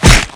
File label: hit axe